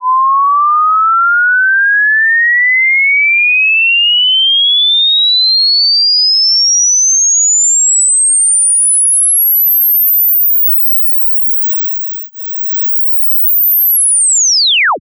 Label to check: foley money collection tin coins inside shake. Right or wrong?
Wrong